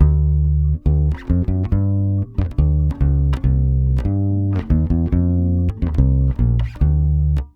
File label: BASS GROOVE, Brazil Bossa; USB Soundscan vol.16